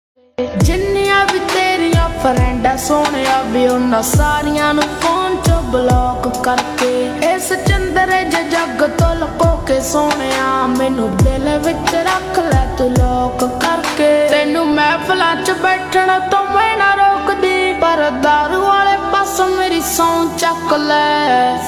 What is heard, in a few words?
Ringtone Slowed Reverb